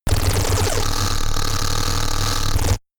space charged laser blaster unsteady; krieg-waffen; soundpool

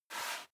step barefoot wood plank slide short; Footsteps; schritte

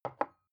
glass put down tipping; objekte

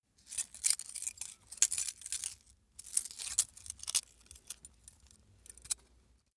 small metal handling scrunch; industrie wirtschaft; metall

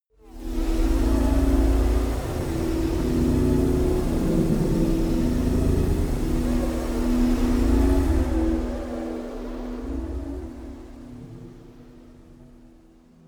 space unknown lively world; atmosphaeren umwelt; weltraum

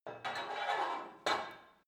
put weight onto barbell quick, fitnessstudio

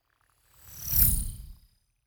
fly by insect drone; swooshes-swishes

